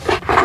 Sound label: barnacle pull; npc; Garry's Mod; hl2